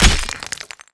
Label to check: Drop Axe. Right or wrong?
Right